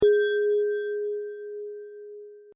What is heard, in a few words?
bell